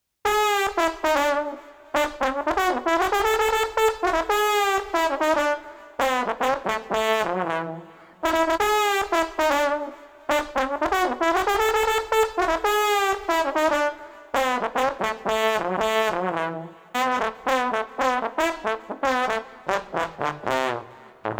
Street Trombone Active 115

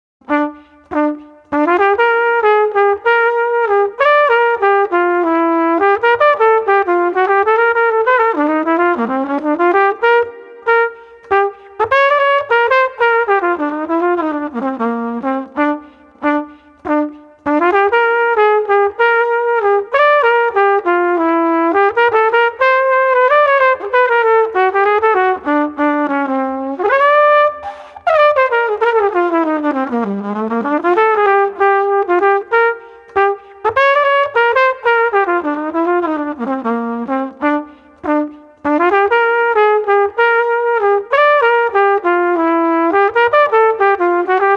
Street Flugelhorn Entertaining 97

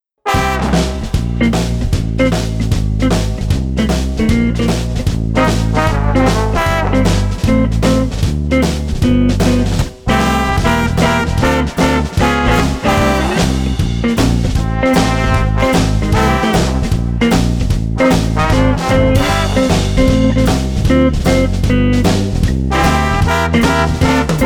Street Jazz Combo Groovin Underscore 152

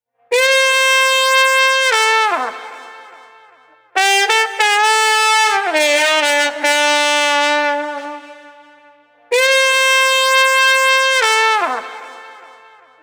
Street Trumpet Solo Lonesome 100

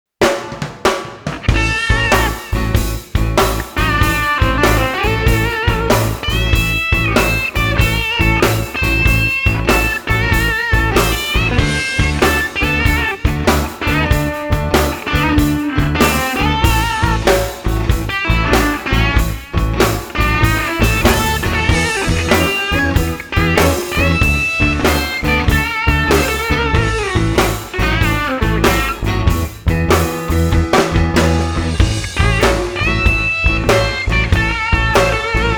Blues Rock Upbeat Shuffle 95

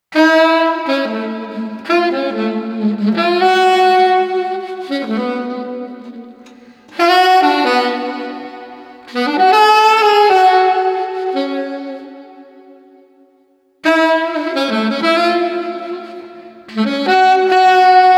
Street Sax Lovely

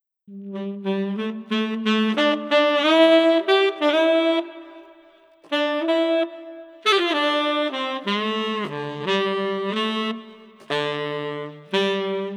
Street Sax Chilling 120

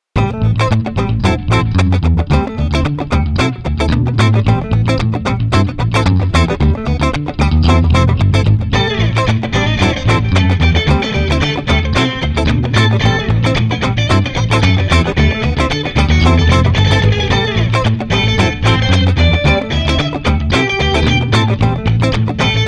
Guitars Funky Jam 112

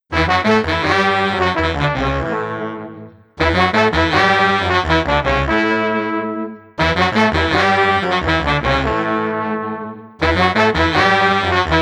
Street Trumpet Tuba 110

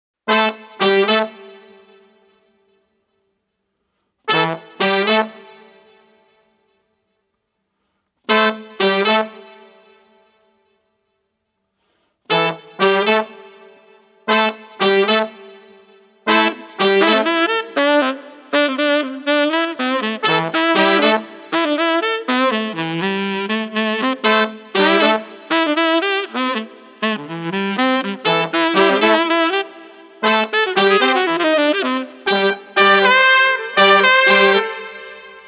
Brass Jazz Party no Drums 120

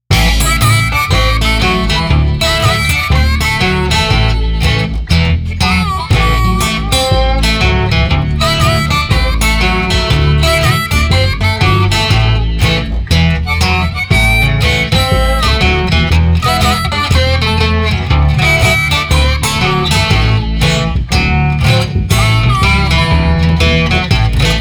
Blues no Drums 120

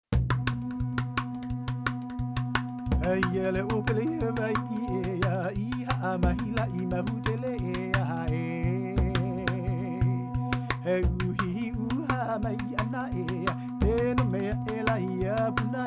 Fire Chant Perc 172

